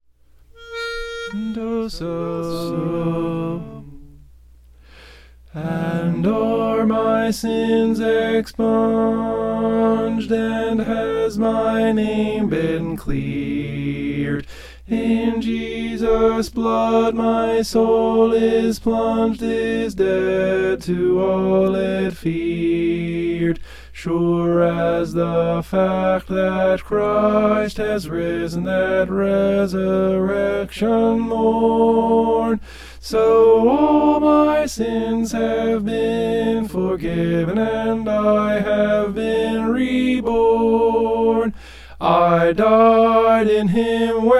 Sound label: tenor